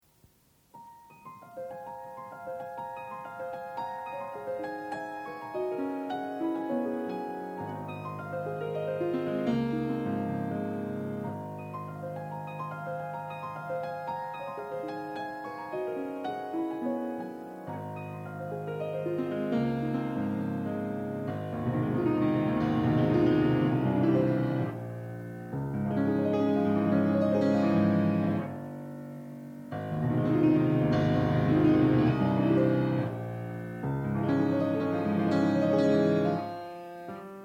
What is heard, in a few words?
Arpeggio Etude; Debussy